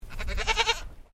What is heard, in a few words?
Animal Goat Toggenburg Baa; Animals from Edgar's Mission farm in Victoria; Goat Sound Effects; Goats (Sound Effects:Animals); Foundation1